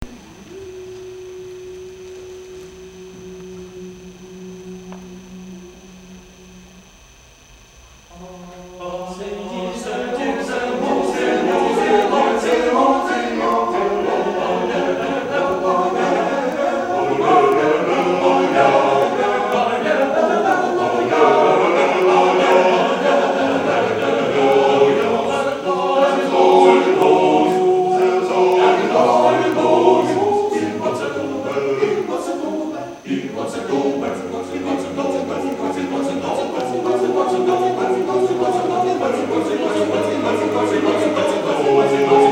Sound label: male choir, live; ascendit deus; male choir of mephi